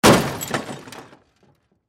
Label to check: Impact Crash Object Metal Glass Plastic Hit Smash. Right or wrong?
Right